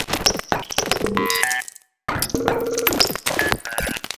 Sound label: Crunchy, Beats